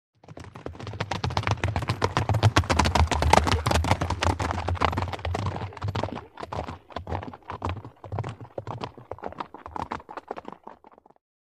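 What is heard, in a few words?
By 2 Horses Canter Past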